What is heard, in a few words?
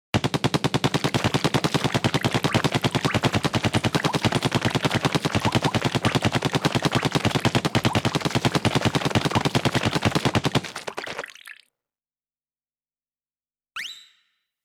Splat Shooter; SE:Splat Shooter; Nintendo